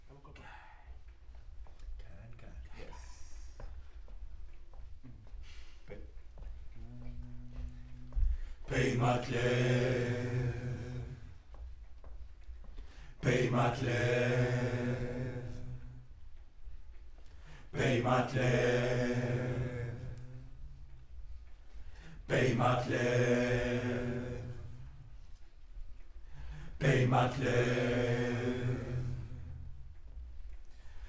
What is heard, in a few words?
Choir; Decca LR